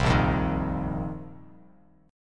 A sharp Suspended 2nd Suspended 4th A sharp, Bass Standard; strummed; A#sus2sus4; JGuitar